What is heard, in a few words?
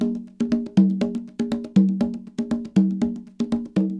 120 con, Percussion